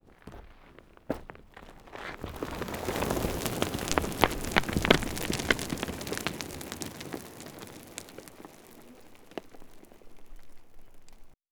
sons foisonnants

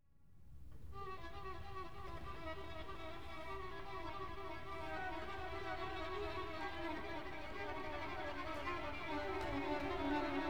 accum descente

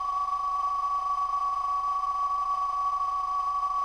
tenue douce

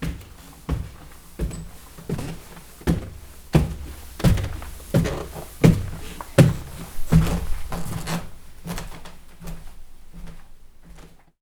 escalier

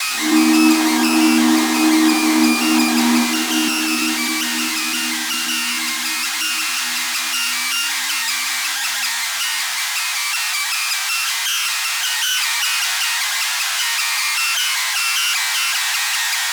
guitare disto

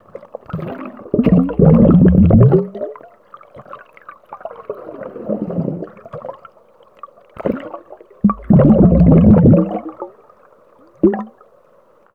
bulle

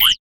triit

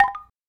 bambou mel

